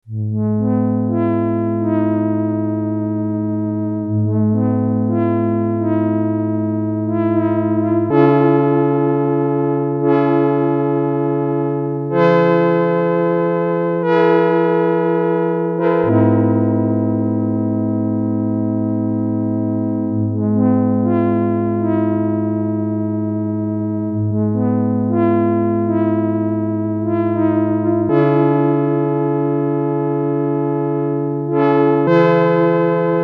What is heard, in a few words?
detuned chords